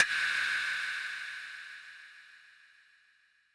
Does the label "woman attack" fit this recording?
No